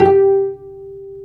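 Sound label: strings cello, dry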